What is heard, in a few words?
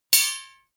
knives large hit chink high